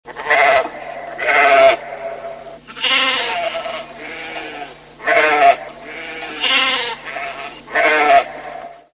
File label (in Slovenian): OVCE; Efekti